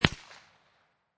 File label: Pistol Liberty 22 Target Pistol Single Shot Exterior At 45'